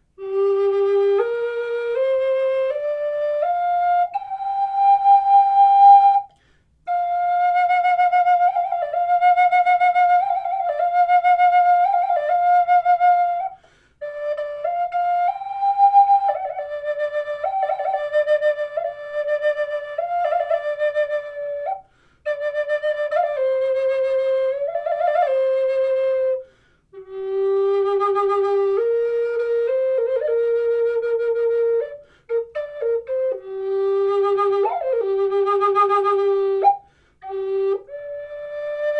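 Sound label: natural, wood flute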